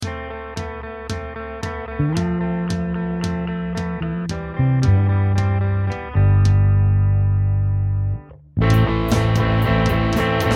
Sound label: 4/4 rock